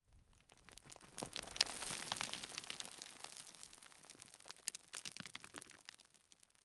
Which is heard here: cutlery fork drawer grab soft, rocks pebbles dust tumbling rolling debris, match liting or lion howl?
rocks pebbles dust tumbling rolling debris